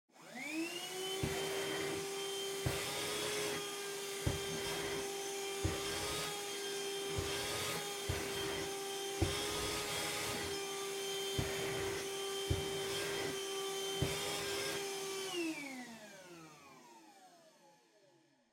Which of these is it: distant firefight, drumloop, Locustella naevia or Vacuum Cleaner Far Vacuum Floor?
Vacuum Cleaner Far Vacuum Floor